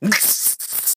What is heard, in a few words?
Scream